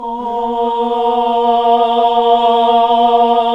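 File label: AAH A4 L, Nuns Aahhh; Sound & Vision - Gigapack II CD 1 (Roland); HAL NUNS AAH OOH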